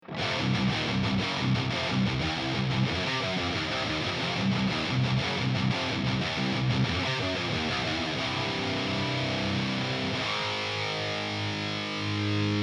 amp; huge amp shootout

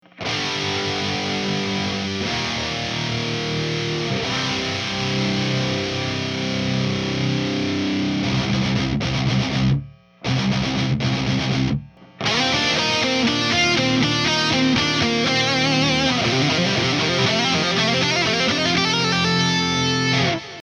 amp

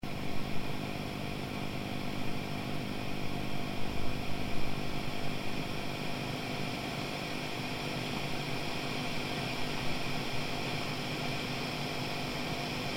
clean, Rebel30 - Hissing

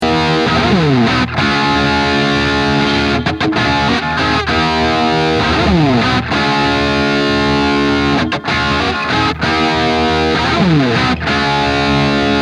celestion

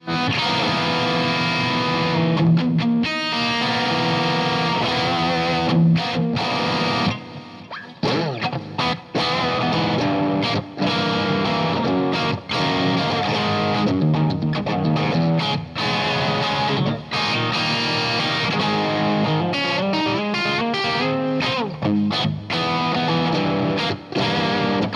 boosted, stacking boosts; peters polaris

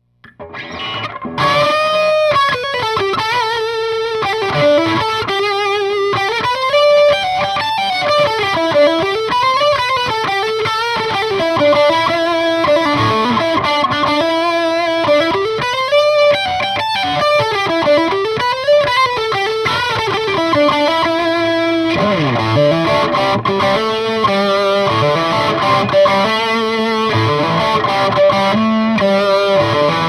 plex noodle

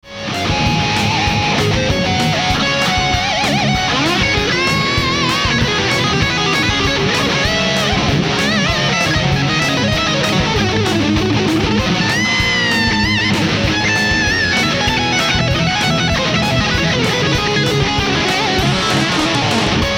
charvel guitar; blackbelts from motorcity pickups; rifftracker

